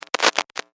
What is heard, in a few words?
Electric Arc Spark Single, Short electric sparking, buzzin; Electricity sounds; Electrical Sound Effects